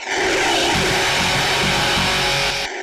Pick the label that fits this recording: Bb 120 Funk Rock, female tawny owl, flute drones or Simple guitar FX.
Simple guitar FX